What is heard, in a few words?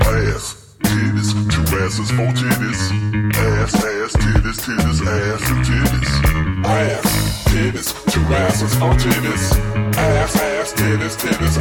Bass